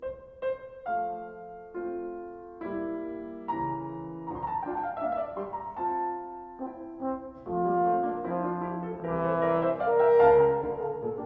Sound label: Sonata For Horn & Piano in F Major, Rondo; Allegro moderato